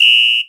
Whisl L, BossDR660 misc; drum machine

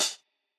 closed hh; eddyflux

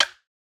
perc; eddyflux

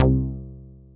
SynBs, BossDR660 fx; drum machine

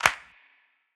clap; eddyflux; dough-samples

